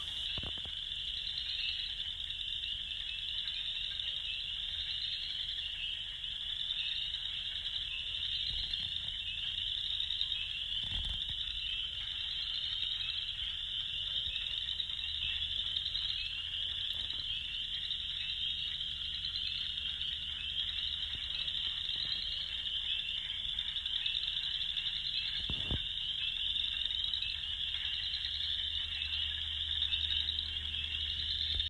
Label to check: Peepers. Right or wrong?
Right